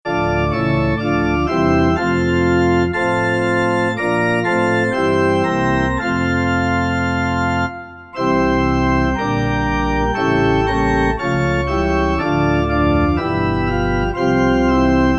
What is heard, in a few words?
Pipe Organ